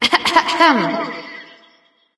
clear throat light; smite; voicepack; hel

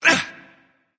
grunt hit; smite; voicepack; janus

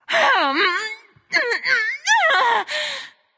grumble; smite; nuwa

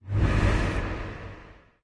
body swish; smite; thanatos

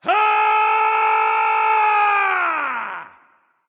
battlecry; smite; khepri

